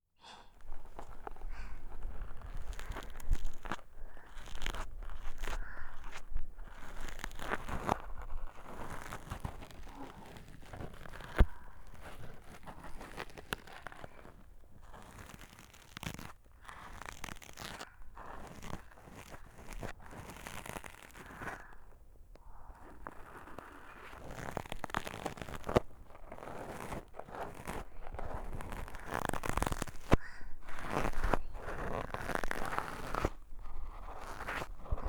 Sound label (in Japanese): 左耳 吐息 耳かき ループ音源; 和み処 一番星～距離感の近い甘えん坊な仲居『月さん』が一生懸命ご奉仕する癒しの 一日～; 月の吐息&寝息 ループ音源